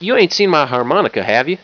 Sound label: harmonica; wanted